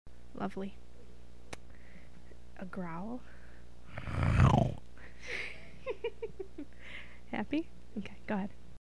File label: growl